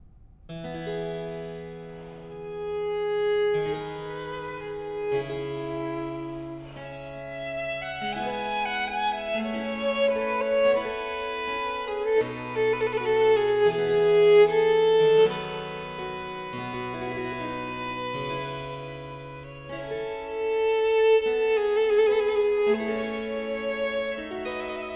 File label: Violin Sonata in D Minor, Adagio; HWV 359a